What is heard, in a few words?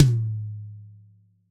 tom hi; soundscrate